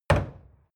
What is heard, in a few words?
wood wood staff indoors